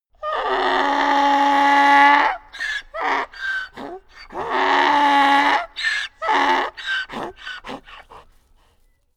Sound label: donkey scream